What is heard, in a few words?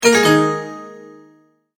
multimedia tone end